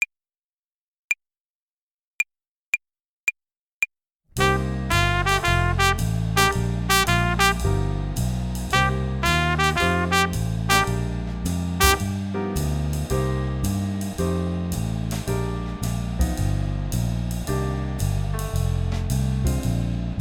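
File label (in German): Swing Phrasierung Übung; play along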